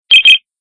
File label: new email sound on WebTV; Remember the new email sound on WebTV?